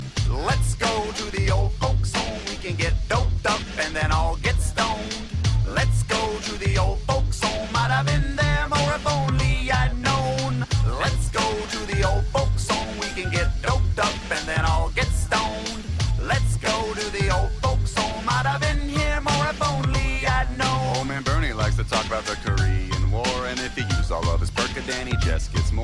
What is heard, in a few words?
Chorus; Wkuk